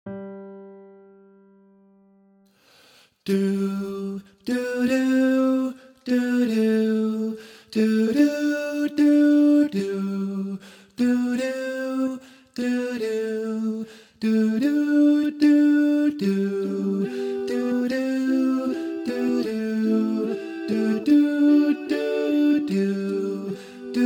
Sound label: SSAA alto